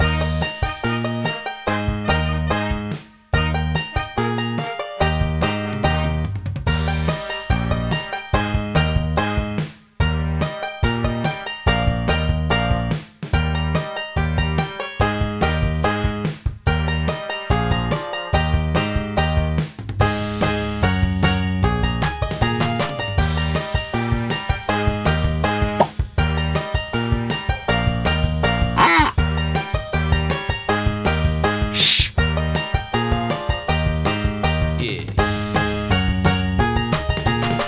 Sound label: Eb Jumping Beans 144, brass